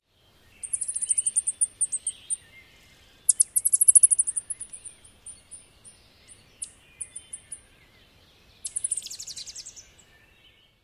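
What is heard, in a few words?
ovenbird more interaction calls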